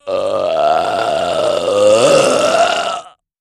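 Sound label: Large Belch Strain, belching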